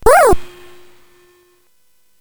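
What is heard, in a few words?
Nebulus, MOS 8580 SID chip music, games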